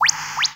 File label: Portraits Bird; Jungle; Blu Mar Ten - Jungle Jungle - 1989 to 1999 Samplepack